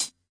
classic hh; STYLE KITS (Hip Hop, Etc); Classic Hip Hop Mega Kit

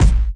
kiks; drums single hits

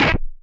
nasty stormfront, Electro FX

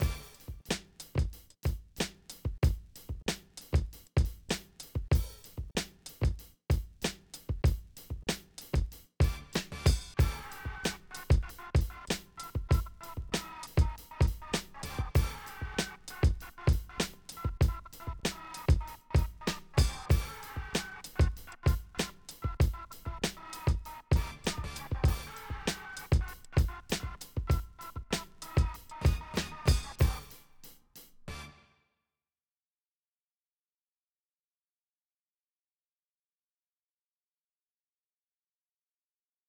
oldschool

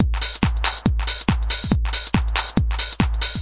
140 clap trap, drum loops and breaks

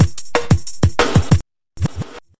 183 bpm, drum loops and breaks; 181 bpm plus

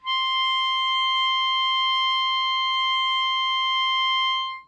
hohner soprano melodica C5

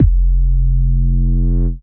kick evolving distorted sine; Kicks; single hits

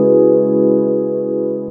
one gentle epiano hit

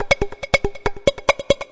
140 hexbeat, DRUM LOOPS and BREAKS

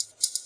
shaker like loop; Ze Franks Pain Pack

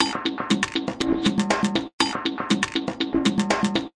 120 vibra clonk bonk, drum loops

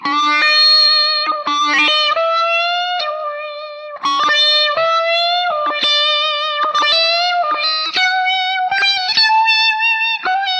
dont kill the wails, Guitar Noizes

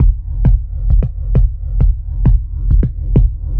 133 bpm, verby kicks, drum loops and breaks

